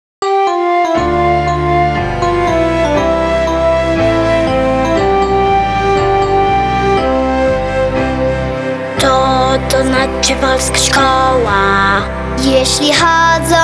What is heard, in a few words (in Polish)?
Hymn Szkoly Polskiej w Rzymie